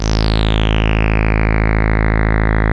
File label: TAURUS C3, synthesizer